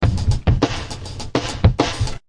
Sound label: 103 hard lofi gates, drum loops and breaks; 101 to 110 bpm